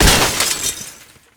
Street Ball Glass Shatter; SFX Kit

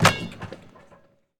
Street Ball Dunk Nylon, Street Basketball; SFX Kit

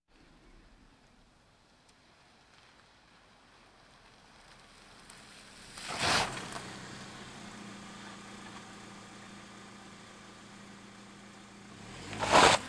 Automobiles various

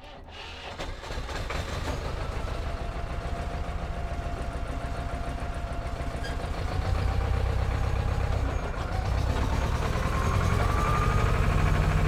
tractor old; best service Blue Box

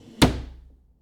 Foosball Goalie Slide

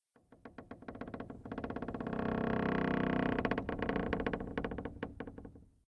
Shed - Wood - Creaking - Short; RadwinIsland

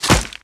Ranged Physical Medium; Kilta

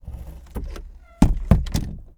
DOOR Metal Close Gently Long; mono; Universal Sound FX; DOORS & GATES

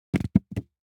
DICE on Felt, Throw and Roll, Foam, 2 Two Dice; Samples; ArticulatedSounds; DICE Sfx Party (Extended)

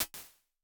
480 Vocal Plate, Impulse Responses; Reverbs; PastToFutureReverbs; CLA; IRs

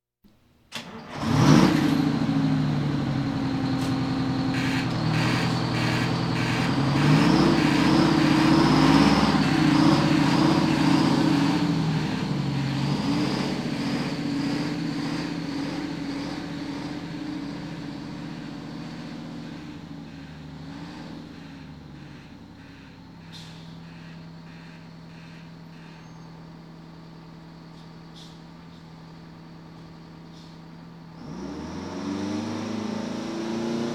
Police, Fire engines

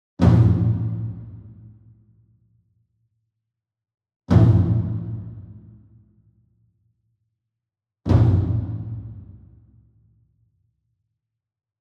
SINGLE DRUM Taiko 28 Inch Flam Soft Beater Far; boom library; Cinematic Strikes Construction Kit

